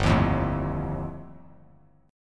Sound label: G 7th Suspended 2nd Sharp 5th G, Bass Standard; strummed; JGuitar